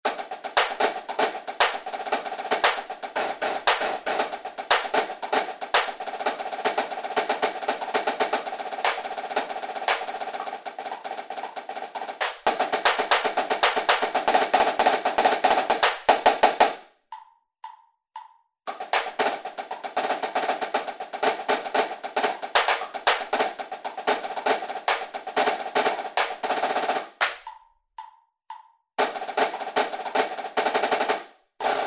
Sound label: Snare Etude